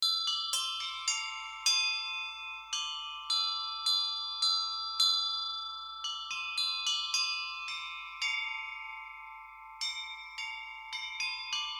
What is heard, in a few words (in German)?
glocken